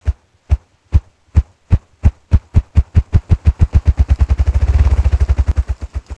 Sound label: ruffed grouse drum